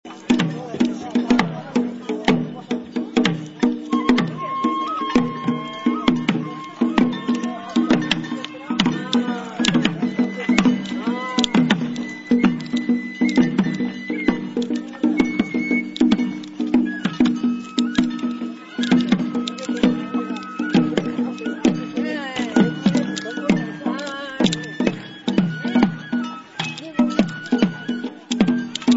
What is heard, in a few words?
banjo